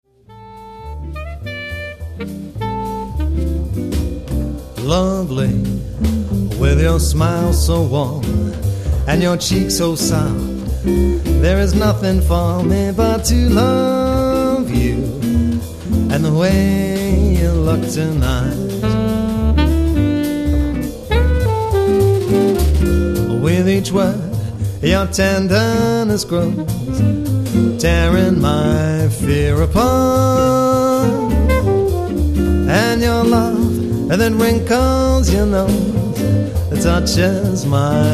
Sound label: quartet